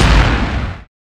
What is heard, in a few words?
hook fire, weapons